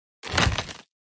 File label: wood material